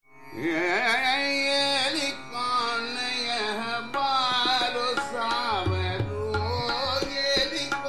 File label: bageshri D. Trital; abhinav geet manjiri; Eri Kaun Yeh Baro